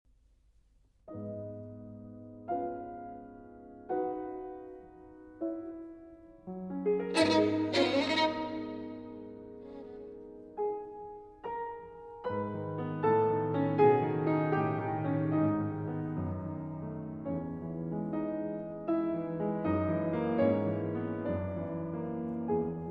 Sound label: Violin Sonata in A Flat Minor, Adagio